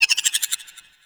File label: Venus Landscape Cave Creature Calls; Warframe; Venus Open World; Ambience